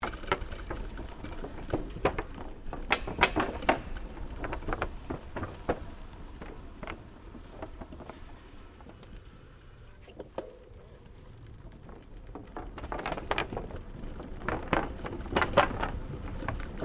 Bicycle Riding Wooden Ramp Stereo, 21 speed road bicycle; Giant Crx3; Bicycles